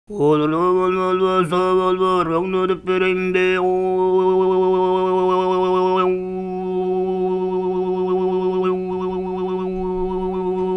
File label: SING, TUVAN MID; Spectrasonics Vocal Planet CD5; World Voices